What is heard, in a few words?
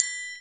TRI, triangles; Univers Sons; Basicussions